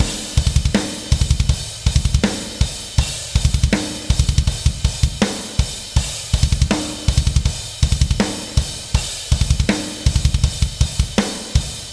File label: Drums and Jingles Battle DRUMS C